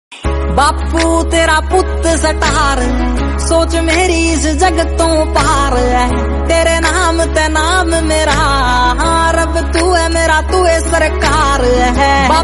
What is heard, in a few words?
romantic, haryanvi